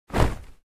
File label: Karate gi fight movements